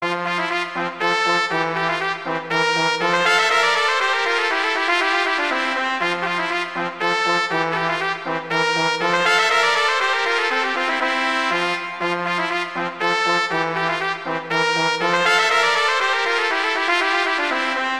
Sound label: Duet; Mozart; Duets